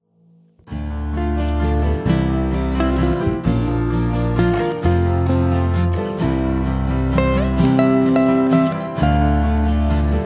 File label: Singing Praises To God instrumental